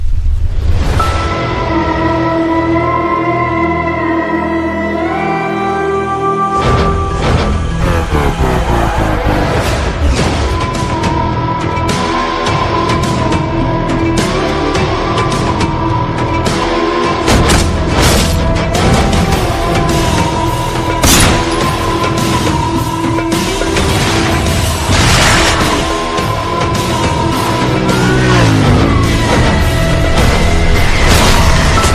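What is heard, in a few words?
background music Ringtone; Kadaram Kondan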